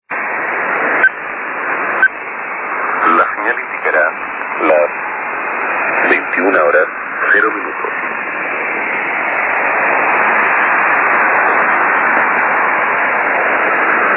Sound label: usb hora, time signals; Ny Valparaiso CCV; the navy station is responsible for the time signals; Tony Paredes, Argentina